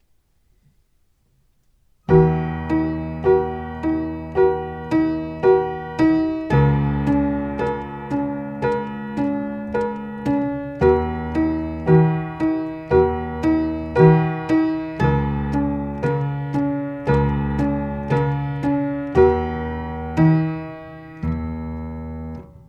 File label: Piano Concerto